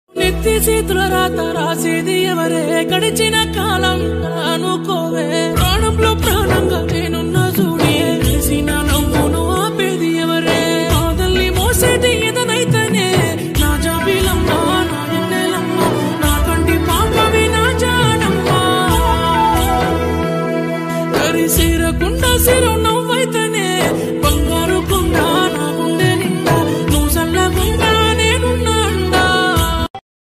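sad love songs sad lyrics telugu love background music ringtones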